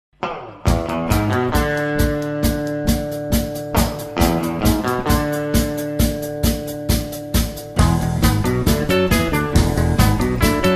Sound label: Guitar